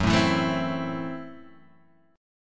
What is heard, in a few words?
A-Minor 6th Gb, chord